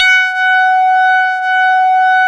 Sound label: 700 B-SAW F#3, Korg; Back In Time Records; Korg Universe VOL-1